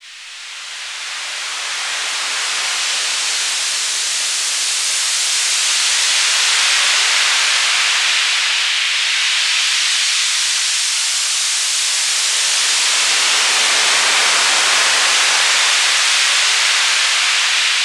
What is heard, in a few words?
atmo, atmosph, special fx twisted; USB Soundscan; AKAI